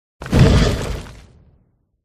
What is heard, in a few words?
taran attack voice up